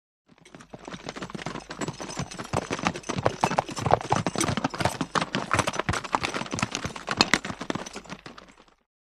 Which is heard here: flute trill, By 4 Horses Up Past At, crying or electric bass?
By 4 Horses Up Past At